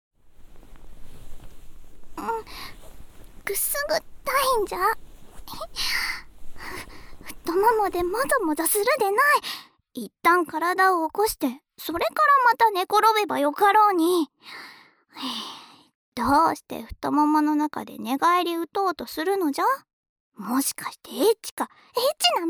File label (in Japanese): 世話好き童女の優しい耳かき・耳掃除（左耳）, ASMR